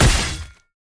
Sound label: attack light; bloom minigame